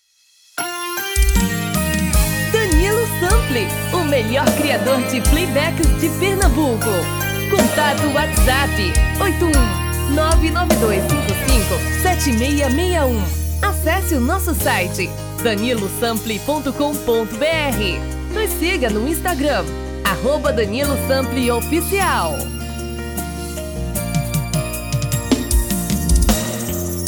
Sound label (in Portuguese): Brega